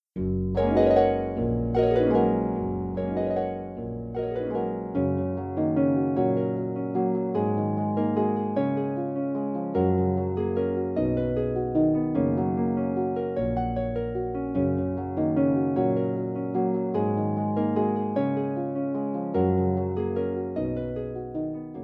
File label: Harp Duos